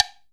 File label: COWBELL; Cuba; AKAI S6000 CD-ROM - Volume 5